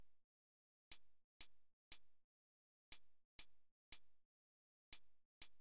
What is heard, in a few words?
superdirt supercollider test hat